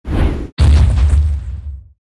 totem golem attack

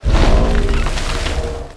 attack

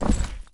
Ashes Butcher move

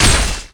Death fire be Shot; GunCrasher

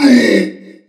Fighter Death; Ferea

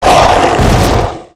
Swamp Ent death; SwampOfDarkness

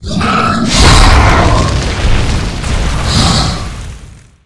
King Attack Mine; Ferea

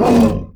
Dead Shoveler hit; KuberaMine

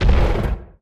move; WoddenBeast; SwampOfDarkness

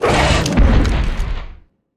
Ashes Butcher death

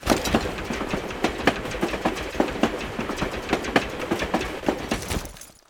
Mine Cart; KuberaMine

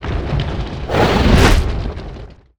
Swampmon attack; SwampOfDarkness

